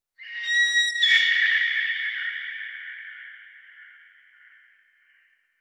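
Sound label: a single powerful and deep, melancholy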